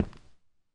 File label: Ice mining